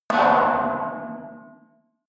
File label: metal impact bar bell large